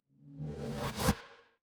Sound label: transition riser